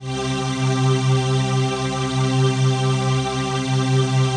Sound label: PHASE PAD